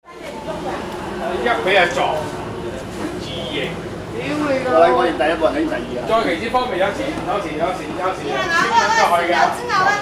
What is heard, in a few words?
street scene in china